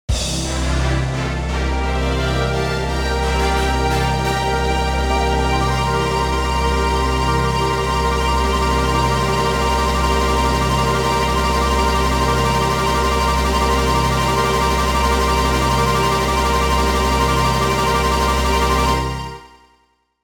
Trophy Presentations; Mario Kart 64; N64

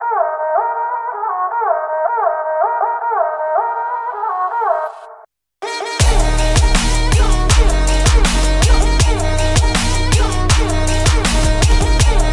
Instrumental; Need for Speed No Limits; NFS Edit Instrumental 01

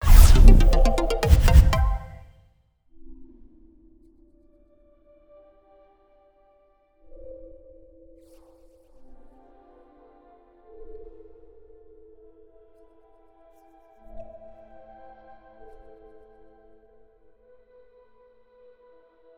Menu level complete; Glitch's Trip

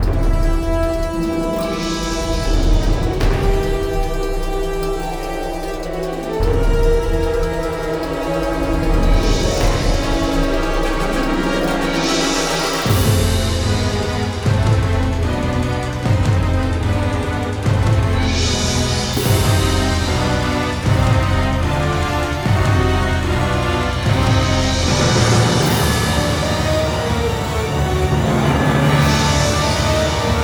Water Battle Theme; Zodiac Orcanon Oddysey

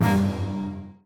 Player Air Dash; Crown

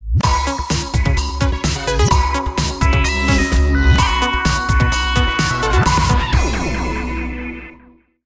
Start Jingle; christmas

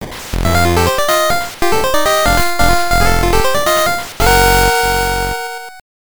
Race Finished; Mickey's Speedway USA GBC

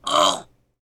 Grunt

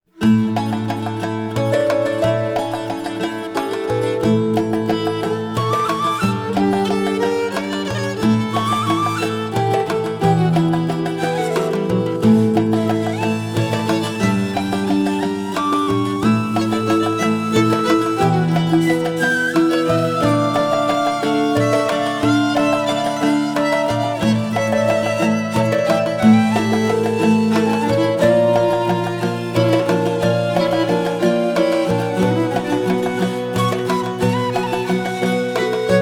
Tavern; Two Worlds 2

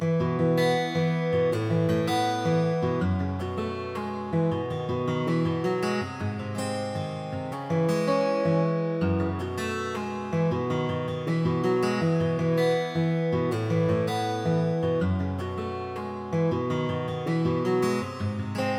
Town Guitar base; backpack hero gamerip 2022

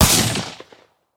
Sniper Shot

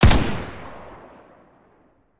M60 Fire; Criminality

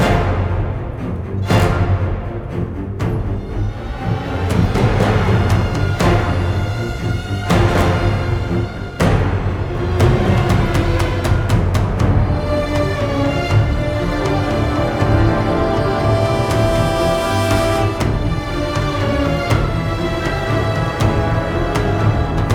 Combat; StormKing

